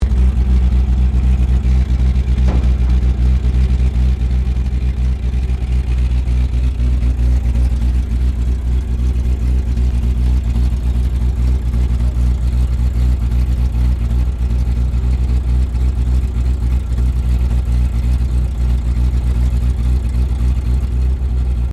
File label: APC FV603 Saracen Idle, British Saracen Light armoured vehicle; Truck Sound Effects; Trucks (Sound Effects:Vehicles)